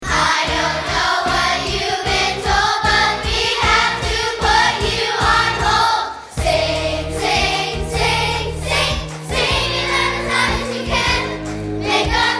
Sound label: Hold Music